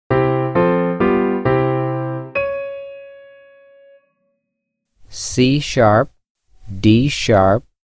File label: C#4 D#6 Grandeur Piano 2 Note Classic; ear training two note; Level Three “Classic” Example